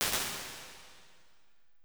Stonewall Room, impulse responses, medium spaces, rooms; Apple